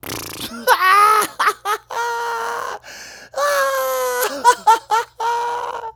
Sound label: vocal male laugh dying